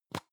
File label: plastic can lid screw open medium; objekte; dose